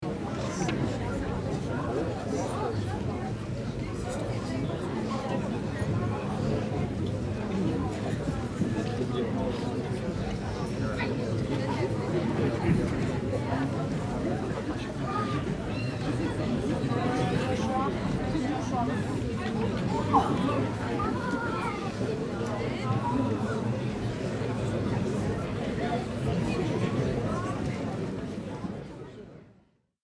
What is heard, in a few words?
Crowd chatter murmur cinema